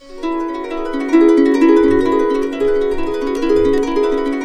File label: BISBI, Complete Harps; USB Soundscan vol.62; AKAI